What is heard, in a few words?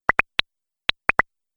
Idea Bubble Pops, cartoon; Cartoon Sound Design